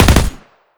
smg fire burst; weapons; smg1

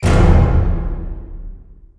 suspense; phx new taunts pack; silly sound effects

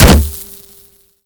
fire, crossbow

